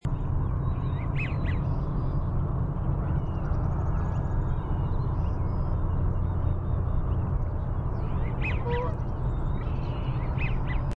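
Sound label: Greater Yellowlegs call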